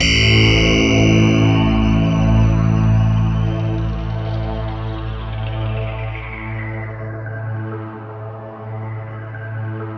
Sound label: SYN SYN, Roland, digital; Sound & Vision - Gigapack I CD 2